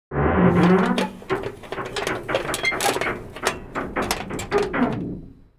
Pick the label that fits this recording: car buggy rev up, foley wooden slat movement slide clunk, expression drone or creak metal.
creak metal